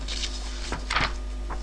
page turn

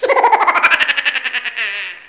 chipmunk

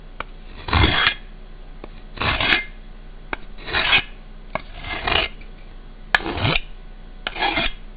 whittle

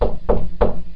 knock

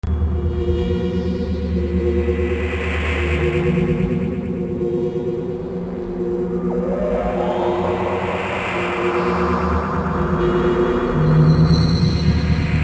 dream atmosphere

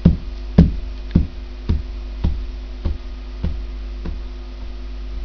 feet on wood

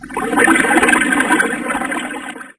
swim away

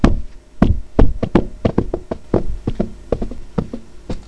tumble

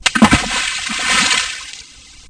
child splash

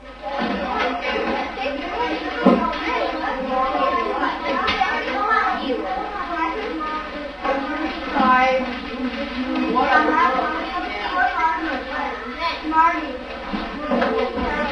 kids in class

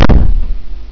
door barge

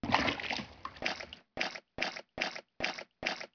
otter

